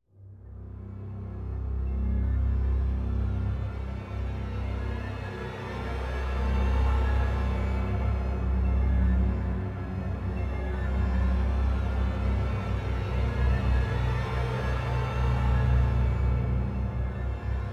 Riser; N.O.V.A. 3 Near Orbit Vanguard Alliance

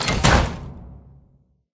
Viking ship Door opens

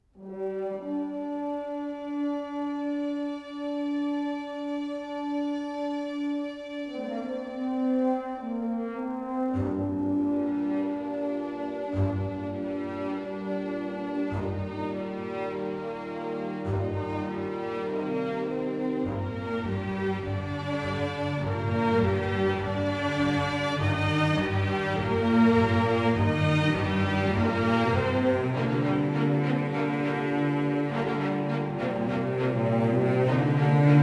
Stem Noperc; Planetary Annihilation

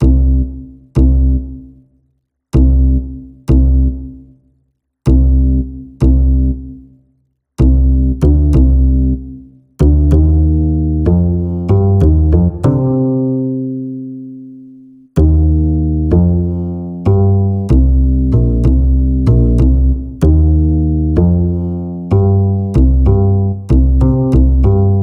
Bass; U G M Electrical2

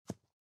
SFX run rock

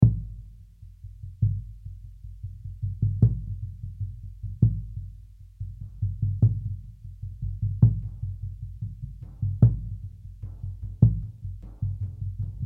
Drums Metal Harp Hits; The Last of Us; Drums Metal Harp Hits Block

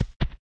Alien walk loop

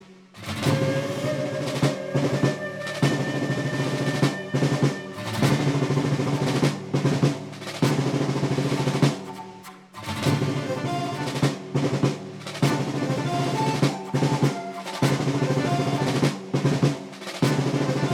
Military Snare

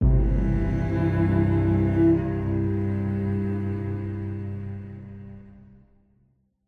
Music Autumn Amb Day Stinger

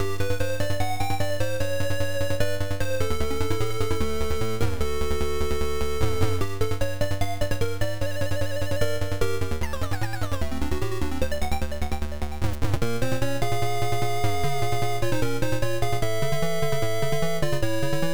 Ruins (Sad); Alchi; treasure of the usas; msx

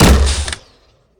Crossbow Shot

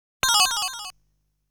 shot sound; Kira gon; Legend of Hero Tonma

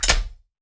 shotgun empty

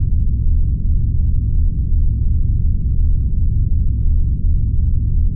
Boss Rumble

